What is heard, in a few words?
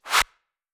hand clap reverse high